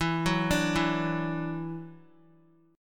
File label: E 7th Suspended 2nd, down, Guitar; E7sus2; arpeggiated